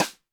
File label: Snare, Roland TD-20